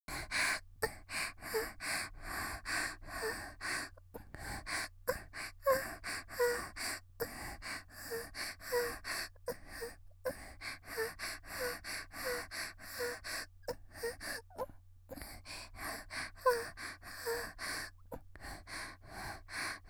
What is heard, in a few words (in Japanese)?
（少女44）「息」感じている呼吸, ASMR; 元気な少女